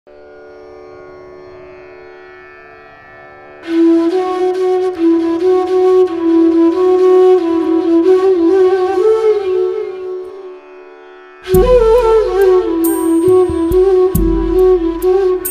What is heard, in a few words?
Flute Instrumental; Neerarum Kadaludutha; Tamil